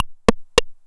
909 mod RIM SHOT

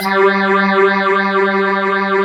G2, Synth; WATERMUSE

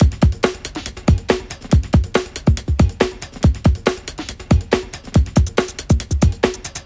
Break Beats, 140 BPM

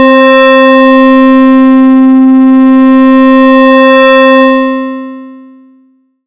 SY HUM; ModelCycles

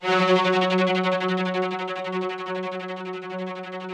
ROUGH STRNGS, Yamaha VP-1; Music Store

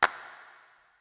Clap, Drum Percussion Single Hits; Zero-G; Nu Disco

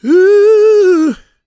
GOSP MALE, SOLO; Spectrasonics; Vocal Planet

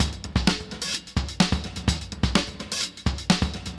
Funky 16 127, Beat Loops; Zero-G; Monster Beats

